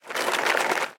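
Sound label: roar of applause in a circus arena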